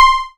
C5, Synth Line; 90 s Sample CDs; NiceBeats; SynthLine VOL-21

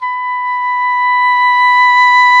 oboe dry; Roland L-CDX-03 Disk 1; Oboe 4-8

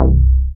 BAS, Eurotech; Sounds Good

